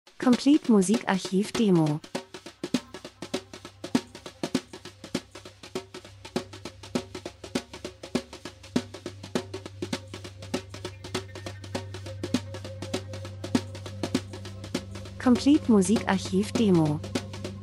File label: drums; Schlagzeug Rhythmus und Percussion Spannungsaufbau Reisen Fernweh Traditionen Land und Leute Reisefilm Natur Dokumentation